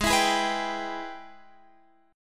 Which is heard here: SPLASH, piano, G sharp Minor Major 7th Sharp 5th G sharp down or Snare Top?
G sharp Minor Major 7th Sharp 5th G sharp down